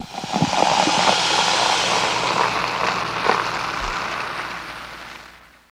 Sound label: Beer Keg Pouring Beer; eating & drinking